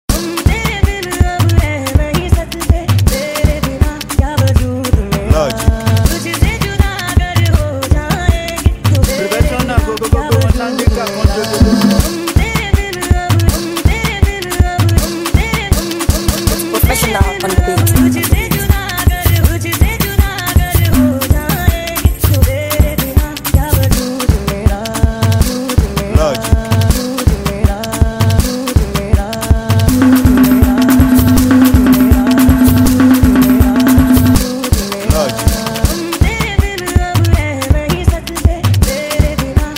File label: Professional Beat